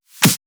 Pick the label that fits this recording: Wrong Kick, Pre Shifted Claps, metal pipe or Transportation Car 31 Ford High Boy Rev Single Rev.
Pre Shifted Claps